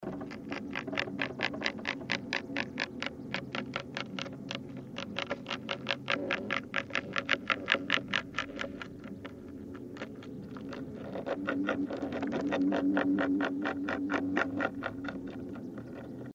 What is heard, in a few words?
Drumming